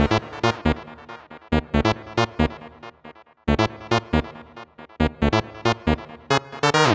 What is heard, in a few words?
Lead, Lead Loops, Club Techno